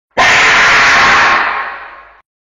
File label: jumpscare